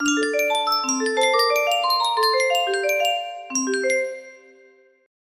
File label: music box melody